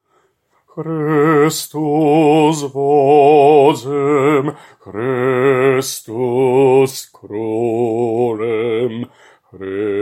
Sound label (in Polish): Bas; Chrystus Wodzem